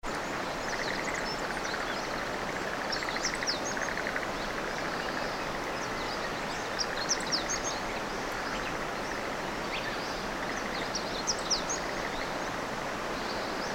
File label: river